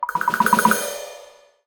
kids game notifications warning negative ding bell drum